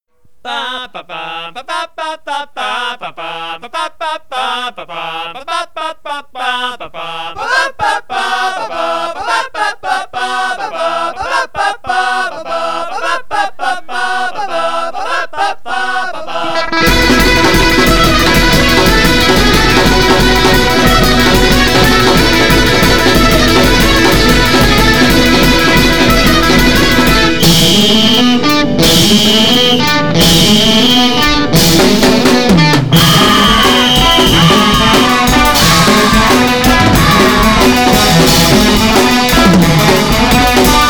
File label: Karaoke